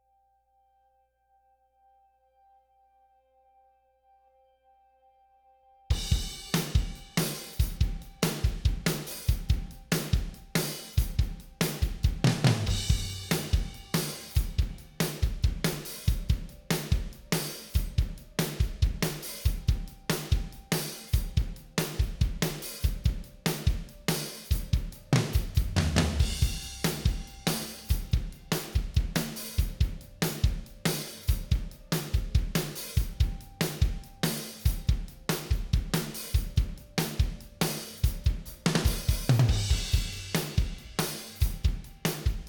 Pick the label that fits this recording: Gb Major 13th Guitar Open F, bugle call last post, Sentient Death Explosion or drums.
drums